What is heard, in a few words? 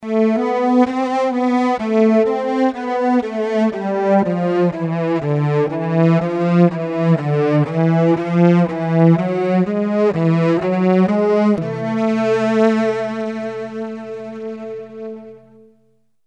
chorus